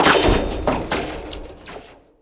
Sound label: suspension cables break